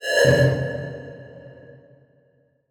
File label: Title Transition Thump; Production Element